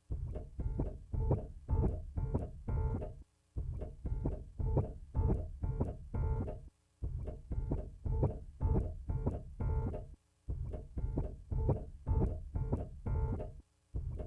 looped rhodes pedal noise

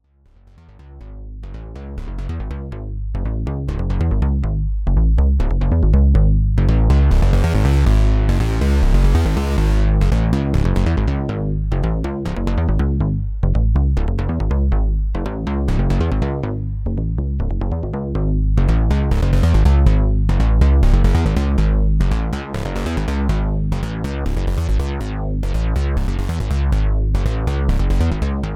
MKS-80 Bassline

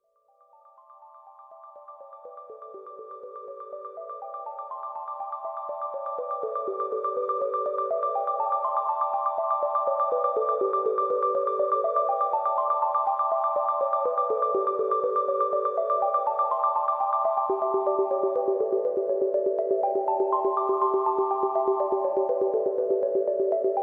Arpeggiated Self Resonance

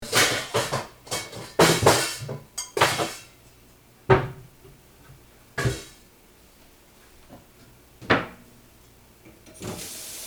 filling the pot

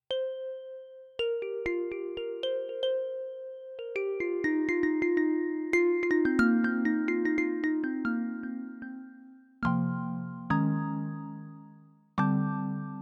Tempest Analog Vibes

